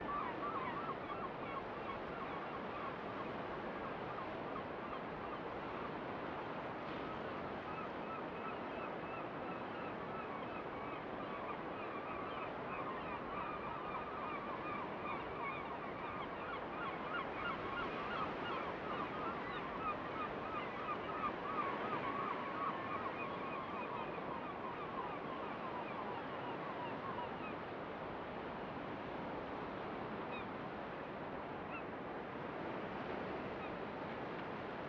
SMOKE NO CLOCK

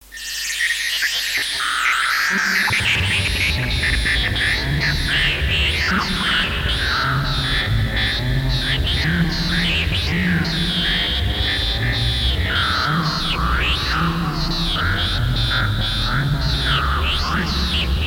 Background; Conquest Earth

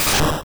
Horn Drill; sfx; attack moves; Pokemon; gen 1; rby

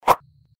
Swish

